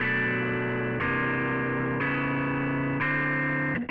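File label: wet guitar chords, Multiband neural audio effect; Demo without amplifier; Wet-NoAmp; prism distortion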